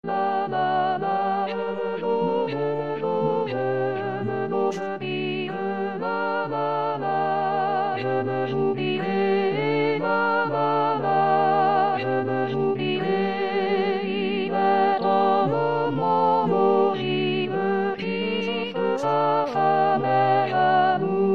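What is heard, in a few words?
soprano; Certon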